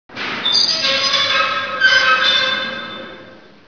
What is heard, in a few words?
metal creak